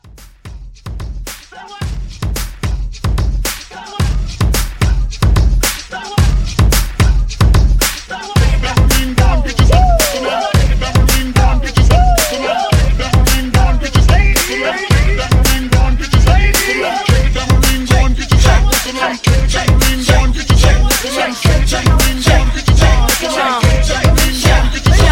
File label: Tambourine Hybrid, 110